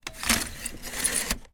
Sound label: household blind vertical sliding open or close